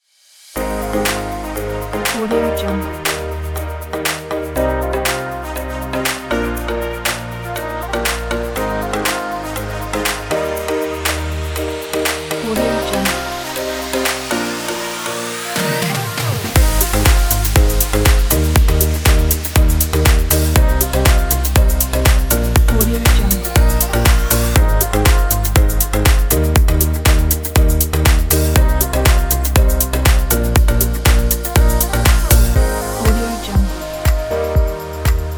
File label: tropical house